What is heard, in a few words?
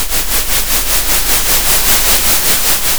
pop, Sound of a train